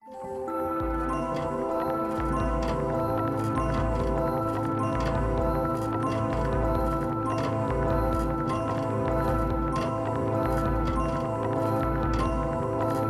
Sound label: dreamful A4